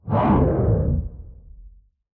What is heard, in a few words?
Fire Woosh; soundscrate